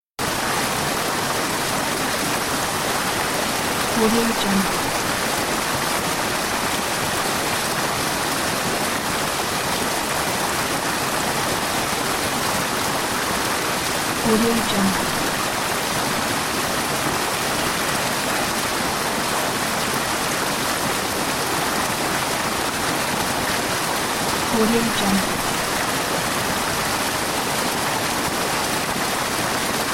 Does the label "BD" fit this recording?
No